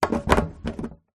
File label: industrial cannister large plastic lid on screw; zapsplat